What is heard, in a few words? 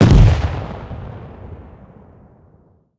expl close, explosion; World of Tanks Blitz